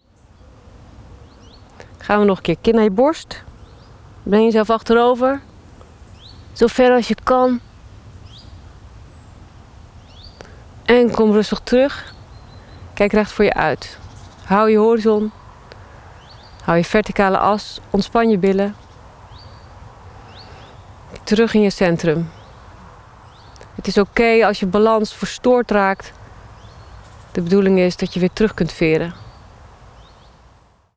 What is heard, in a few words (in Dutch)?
Tibetanen